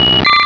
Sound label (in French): Cri